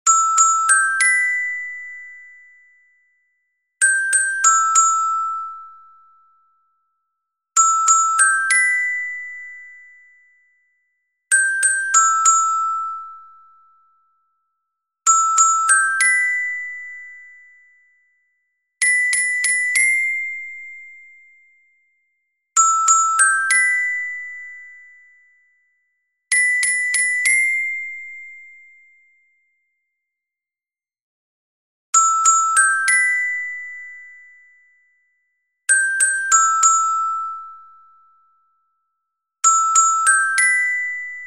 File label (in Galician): Carillon Soprano; NON CHAS QUERO